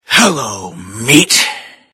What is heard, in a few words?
cannibal alert; frank